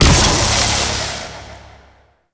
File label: Feast Buff Impact; Warframe; Revenant